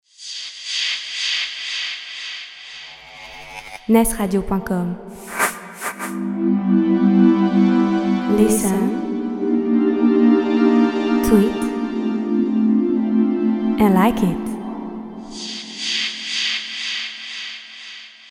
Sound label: jingle intro